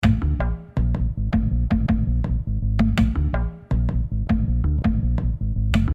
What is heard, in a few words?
loop